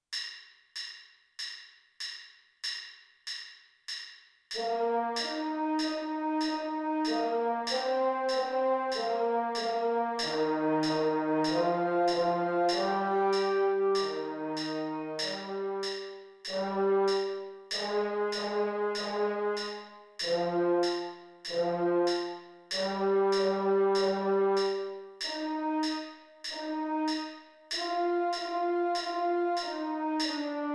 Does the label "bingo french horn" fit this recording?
Yes